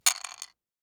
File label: DICE on Wooden Tray, Throw and Roll, Mini, 6 Six Dice; Samples; ArticulatedSounds; DICE Sfx Party (Extended)